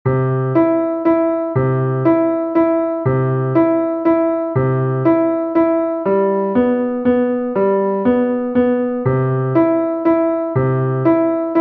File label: Valse piano